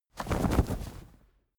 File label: flag small flap